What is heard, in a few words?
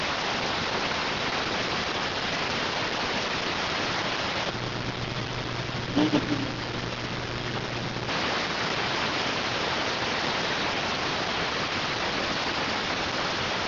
rtty rx